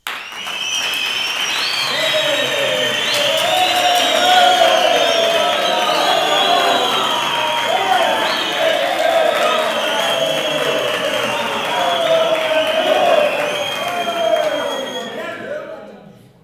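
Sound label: African cheer; Spectrasonics; Heart of Africa 1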